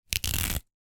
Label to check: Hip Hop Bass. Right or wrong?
Wrong